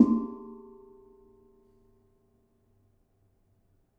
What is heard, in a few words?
Ketuk, gamelan